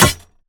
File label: mountain dweller tool metal bar impact; Bluezone Corporation